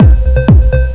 KICK BLOB LOOP

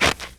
step; wob2 demo

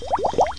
Tiny Bubbles

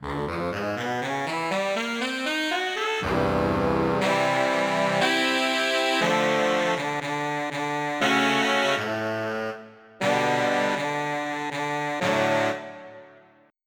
Vib&Growl Lyr; SoundFont - Traditional, Voice & Keys - Volume 2

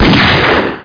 sniper; SpecOps2MPlayerDemo

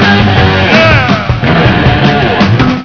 Riff; Maelstrom 1.3; Jimi Riff

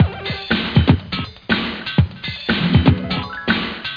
theme drum loop; blender volume1.5 1995

